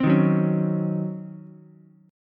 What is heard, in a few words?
Db Major 7th Suspended 2nd Suspended 4th, Db, Ukulele; DbM7sus2sus4 strummed; JGuitar